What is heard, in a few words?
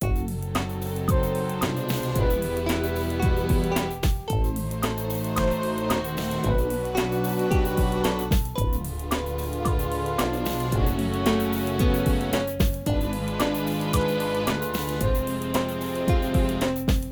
Music; quest for the code windows gamerip 2002